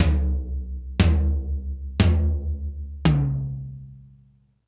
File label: Toms, E-MU Pro-Cussion